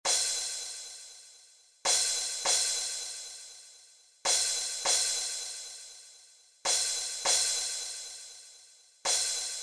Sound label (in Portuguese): Toque - Prato